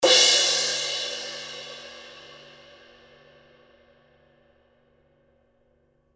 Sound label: 2002 black 20 big beat edge, paiste